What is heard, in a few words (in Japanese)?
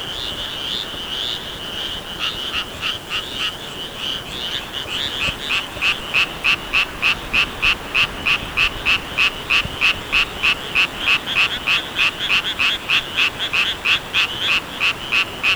amagaeru; kankyouhozen